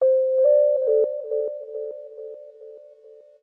TECHNO EXTRA 140

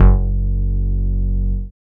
BASS MINIMOO

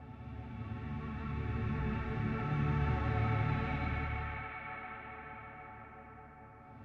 SPACE PAD 140 A; Keys; SpaceSounds

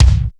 BD, bass drum; BASSDRUMS; BD PRINCE 2

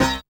dance chord

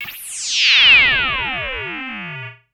TECHNO FX 140

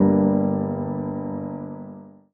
E Keys, One Shots; Keys & Synths; Touch Loops; BlissedOutBeats

